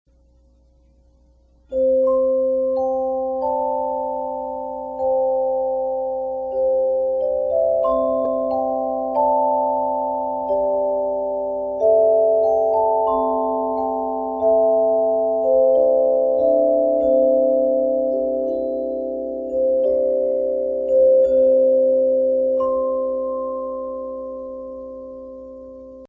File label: C Major